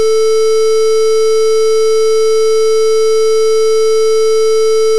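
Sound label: Dithered Sine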